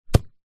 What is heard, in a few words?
sport soccer ball single bounce on grass